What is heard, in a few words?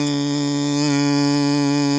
hum D